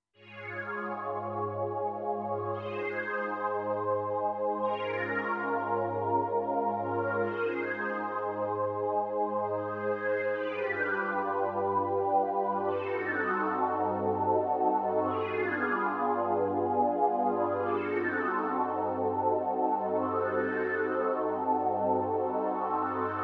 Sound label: Pan Pad